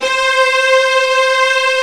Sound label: STIRRINGS, D - 70; Rubber Chicken Software Sample Bank